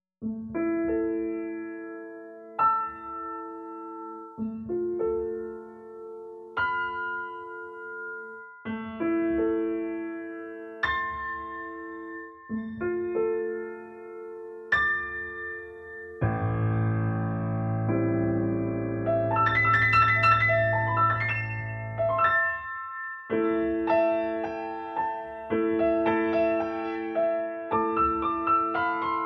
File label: PIANO